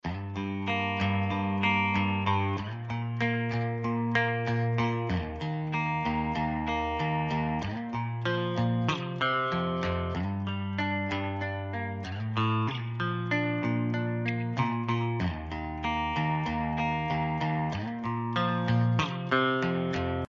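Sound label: zvuk gitary, guitar sound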